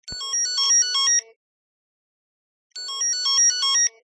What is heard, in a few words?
maxtor melody drive with stuck spindle